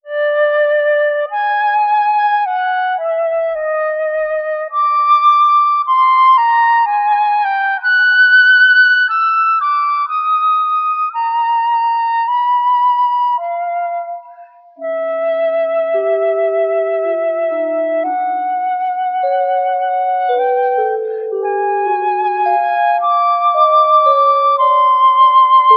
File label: flute, Sad; Loops